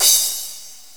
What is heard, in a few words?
Crash Cymbal 2; GMPlay; TG300B